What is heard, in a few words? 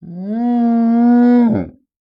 Animal Mammal Herbivore Cattle Bellow; animals; AdobeCC